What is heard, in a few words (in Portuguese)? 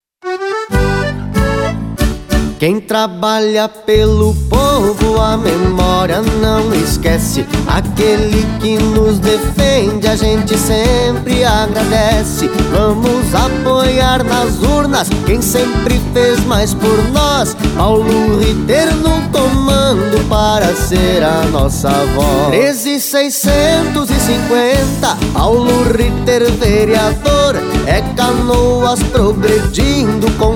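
jingle reggae prefeito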